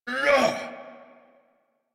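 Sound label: Ability Exert; Dialog; en; Warframe; Veilbreaker; Kahl Barks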